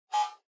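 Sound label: Doorbell Mechanical Buzzer Far 60Hz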